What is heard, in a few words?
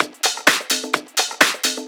Latin Loop 128 BPM